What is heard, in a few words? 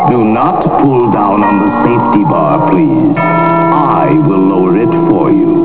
safety bar, Haunted Mansion Ballroom; disney